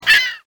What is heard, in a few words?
SEAGULL